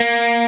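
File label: Arpeggio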